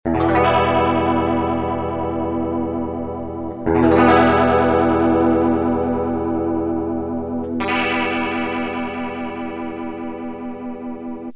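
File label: clean electric guitar; clone theory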